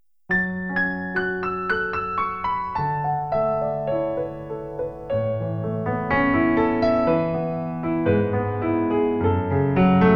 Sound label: GRAND PIANO; Alesis Vortex Wireless 2